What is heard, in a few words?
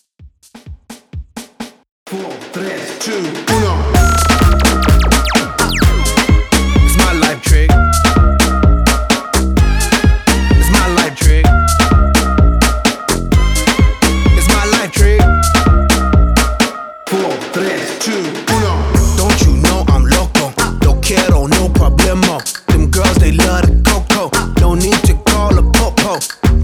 Top40 Redrum 128 Bpm